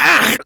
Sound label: Pain, Barks; Kuva Lich; Persona D